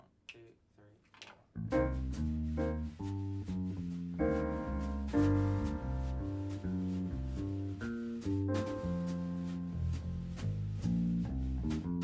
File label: jazz Solos